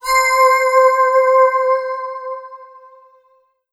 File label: Yamaha TG77 Heaven Vox C5